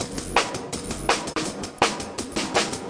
live reverbo crunch, drum loops and breaks, 165 bpm; 161 to 180 bpm